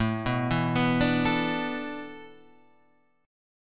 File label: A Suspended 2nd Suspended 4th, Guitar, Standard; Asus2sus4 arpeggiated; JGuitar